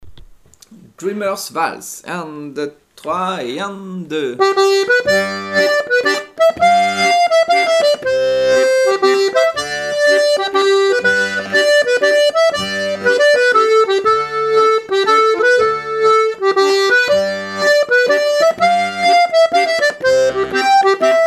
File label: waltz